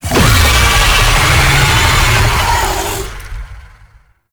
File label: Boss Vocal Spider Summon; Warframe; Oraxia